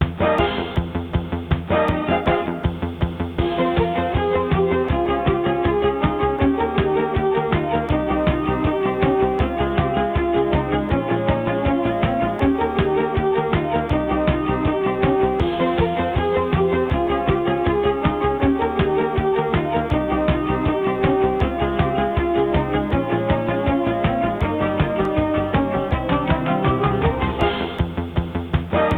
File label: Intro SB CT2770; Links